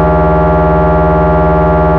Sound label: Static Chain, Vector FM